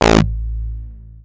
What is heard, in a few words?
dance, bass